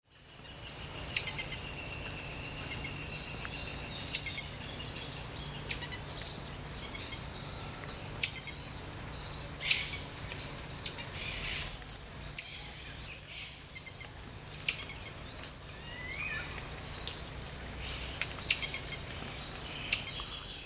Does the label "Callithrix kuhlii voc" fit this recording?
Yes